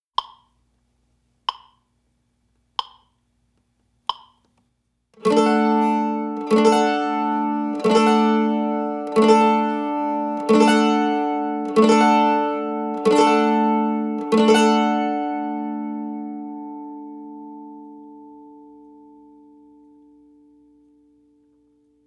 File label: Simple Strum